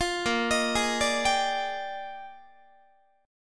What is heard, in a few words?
Bb 13th Bb Guitar, chord; arpeggiated; 20,18,18,18,18,18